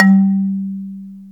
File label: MARIMBA E-2; AKAI S-Series CD-ROM Sound Library VOL-8